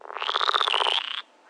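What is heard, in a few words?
ambience China 3D ambience; rabbids multiverse; iOS, macOS, Mobile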